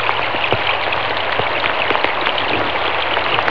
rain drips

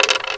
coin

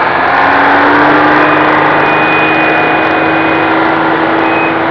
18wheeler pulls away; Truck

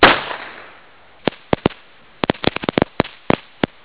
firecrackers

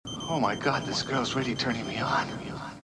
turn on; movie; Flash Gordon

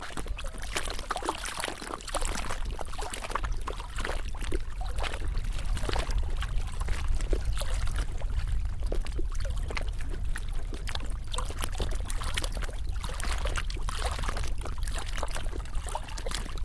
Water Lapping; Water lapping 1

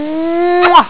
kiss

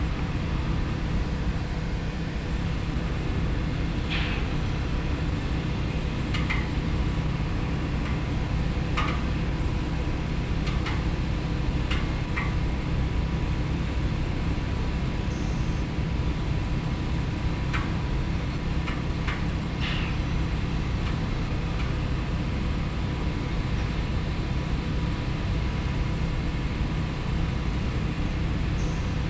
Factory External, urban, factory

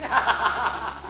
laugh man

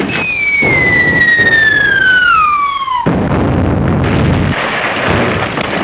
bomb

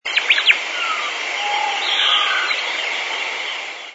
forest bright

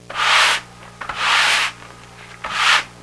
broom

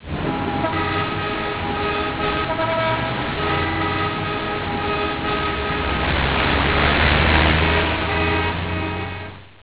traffic

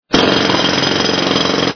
jackhammer; Machinery Sound Effects

